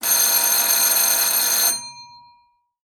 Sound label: calling